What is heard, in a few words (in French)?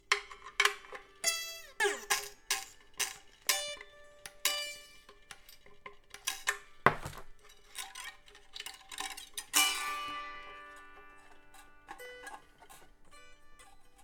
lyre